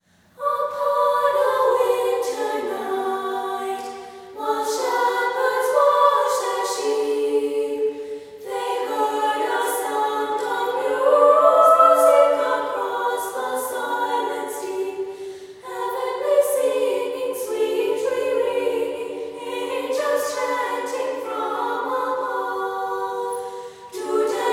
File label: sicilian bagpipers carol